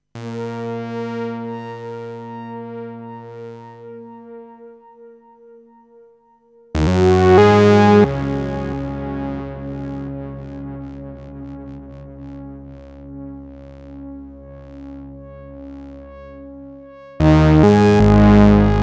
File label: PERfourMER LFO